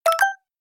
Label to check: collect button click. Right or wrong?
Right